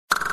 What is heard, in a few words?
pop low